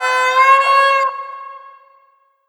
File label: VIOLIN FX; USB Soundscan vol.60; Asian Traditions; AKAI; VIOLON FX